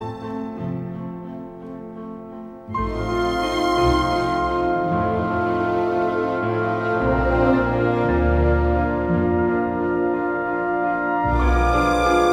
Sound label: Piano concert